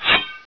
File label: Knife Attack